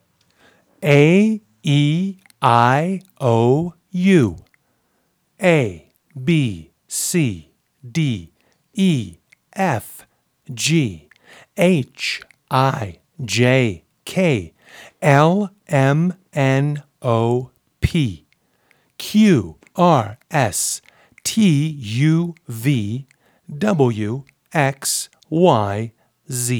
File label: vocoder calibration wave; analog synth